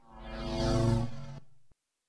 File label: warp; GrandFantasia